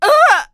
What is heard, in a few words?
Enemy Generic Human Death Crushed; rampage arcade gamerip 2018